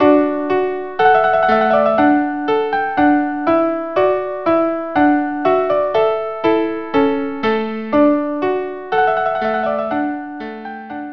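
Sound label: violin viola duo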